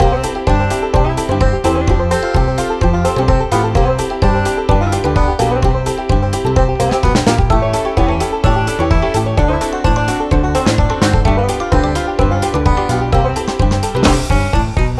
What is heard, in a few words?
happy banjo music loop; soundroll